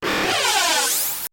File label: Scifi Production Element - Pan Zoom